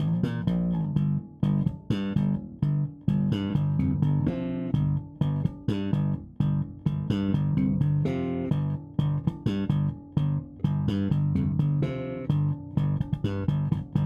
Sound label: Bass Amp